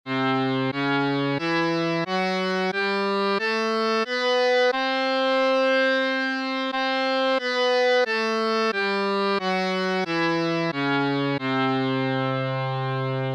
marwa, hindustani thaat

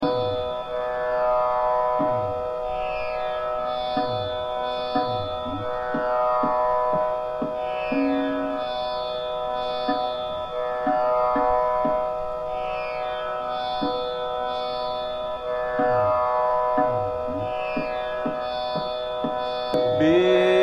Bada Khyal, Ektaal Vilambit; Bhatiyar; Biti Jaat Sagari Rain

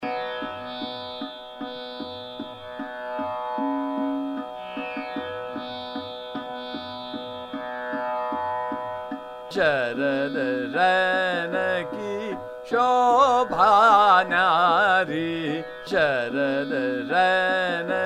Chota Khyal, Trital; Saraswati Kedar; Sharad Rain Ki Shobha Nyari

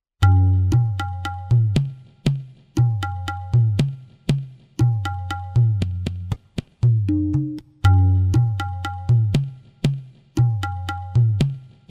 gaj jhampa, tala

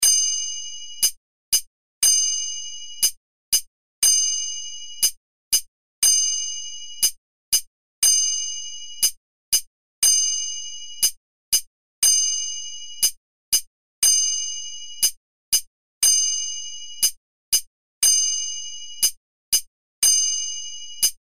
catasra jati, kartal

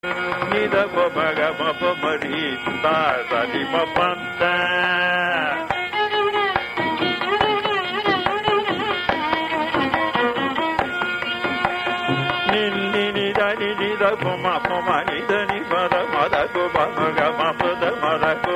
surutti varnam swarakalpana

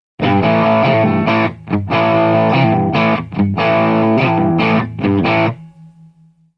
rock

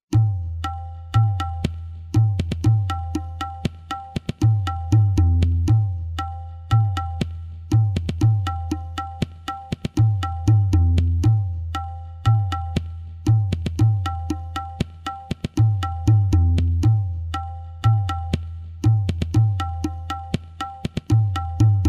campaka savari, tala

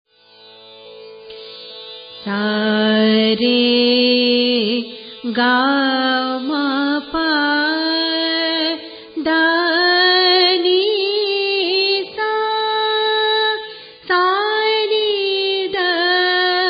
kafi

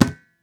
khol ka

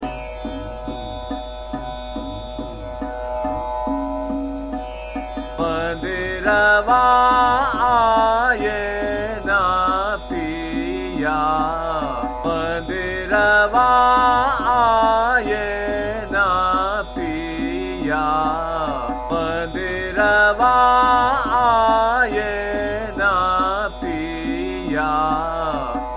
Chota Khyal - Trital, Khambavati; Mandirwa Aaye Na Piya

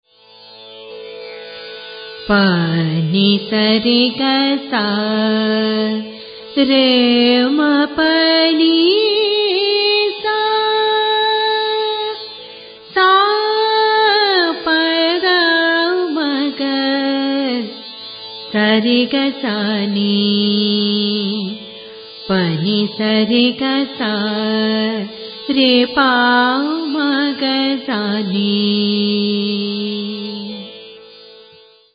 tilak k, raga, aroh

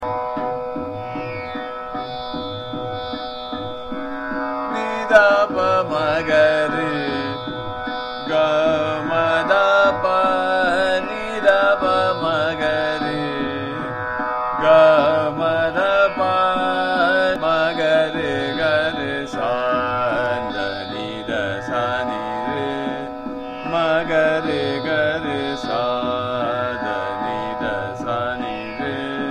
Sargam, Ni Dha Pa Ma Ga Re, Trital; Charukeshi

